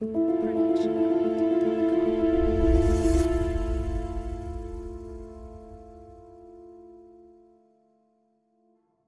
Mystery Piano; soundscrate

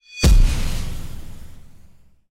Epic Metal Hit; soundscrate

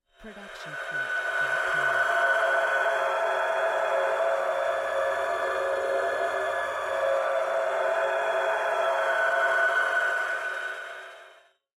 Screamy Screetchy; soundscrate

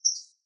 Bird Chirp; SoundsCrate

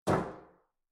Metal Footfall; soundscrate

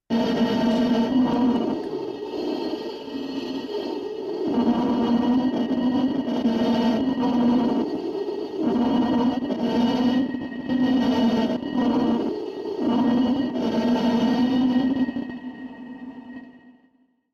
Distorted Haunting Voices; soundscrate

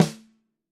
snare; soundscrate

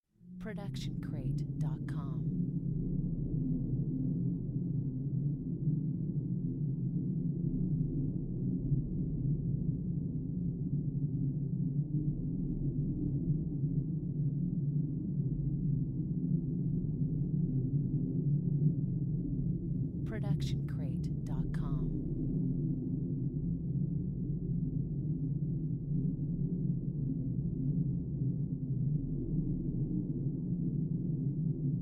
Horror Ambience Dead Winds; SoundsCrate

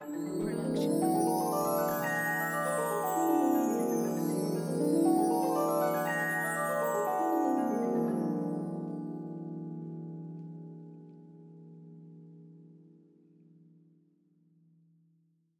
fantasy gliss; soundscrate

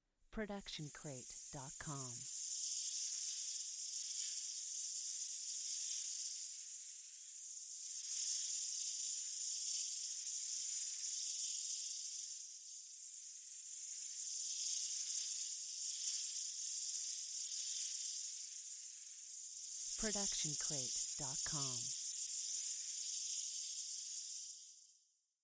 Magic Portal; SoundsCrate

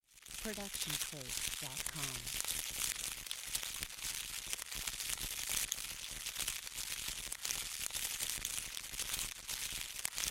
static fire; soundscrate

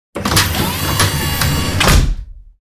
Robotic Movement; SoundsCrate

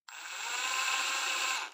drill regular short; SoundsCrate

